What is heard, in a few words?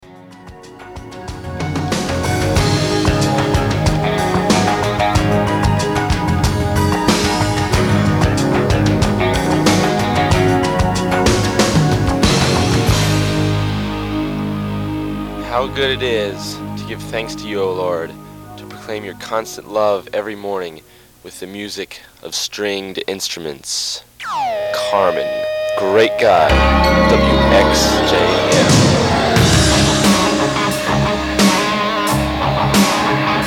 stringed instruments